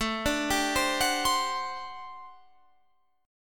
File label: G Major 7th Suspended 2nd Suspended 4th A